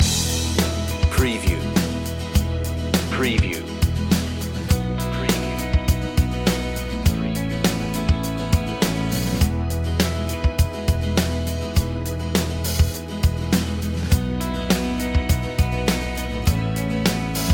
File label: white feeling loop